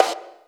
snare fx; DnB; Breaks N Beats Sound Kit; DnB Kit 2

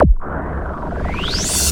Loops Fills; Vengeance Samples

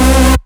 Synth Shot; Vengeance Samples

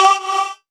Vocal Oneshots; Vengeance Samples; Vocals

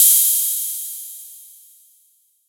Open Hihats, Cymbals; Vengeance Samples

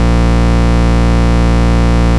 Root A#, Ultimate Bass; Vengeance Samples

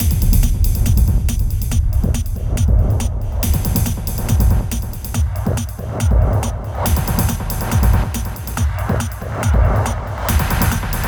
140BPM 16Bars, HandsUp Trance Fills; Ambitions; Vengeance Samples

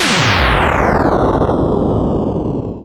Downlifter

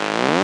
Slides; Vengeance Samples; VEH1 FX

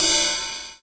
Ride, Cymbals; Vengeance Samples

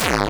BD Distortion, Bassdrums; Vengeance Samples

